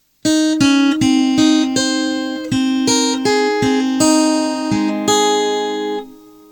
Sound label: jr chime